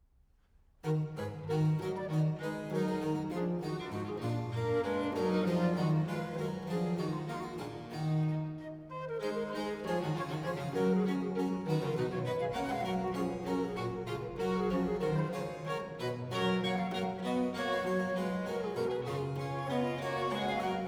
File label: Trio Sonata No. 3 in E Minor, HWV 398 V. Rondeau